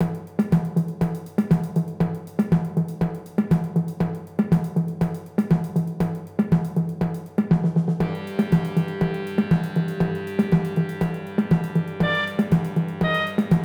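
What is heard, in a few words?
samba, clarinet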